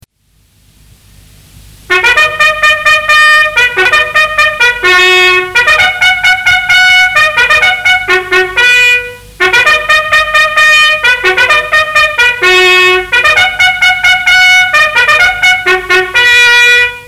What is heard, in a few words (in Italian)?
puniti, squilli di tromba in caserma